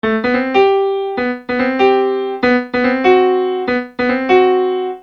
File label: Acoustic Piano